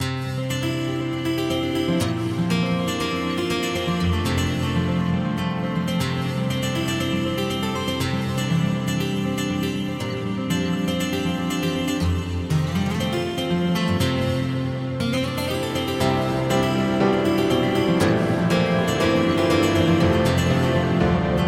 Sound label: Cathedral reverb